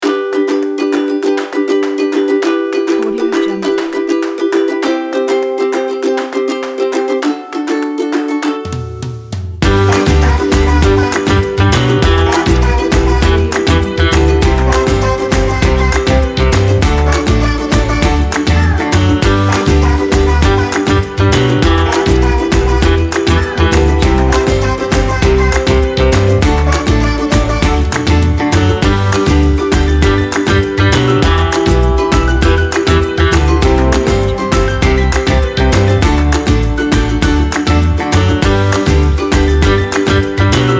fun children

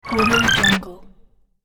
recharge item

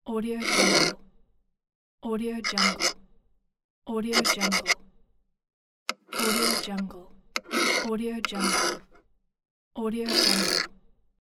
scraping

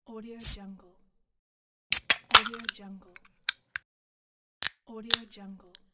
opening soda can

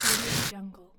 scifi alien ore extraction

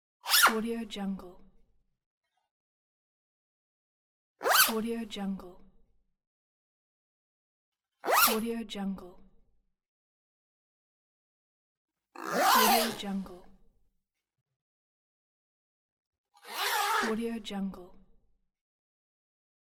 zipper bag

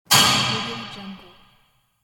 tumbler

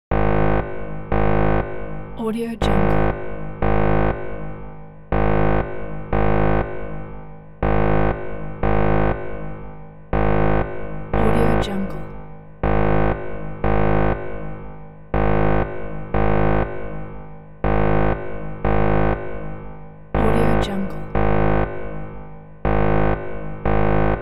military alarm

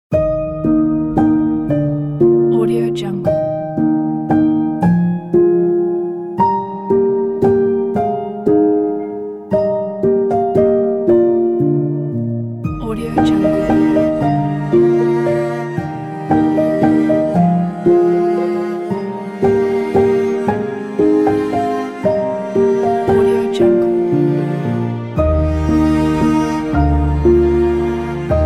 soft love; melodrama-studio

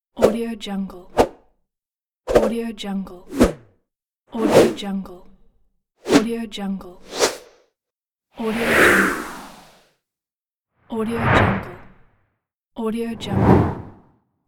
infographics sound effects pack

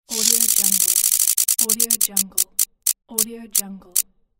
wheel of fortune

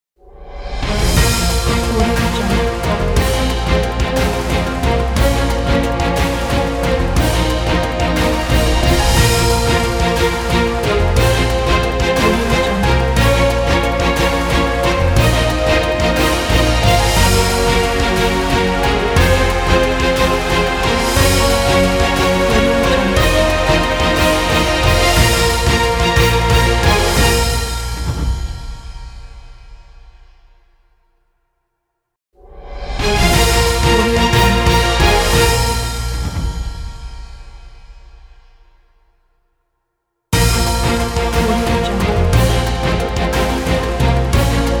political news

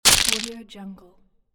stone hit

